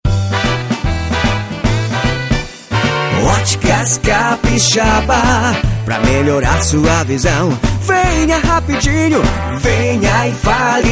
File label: jingle otica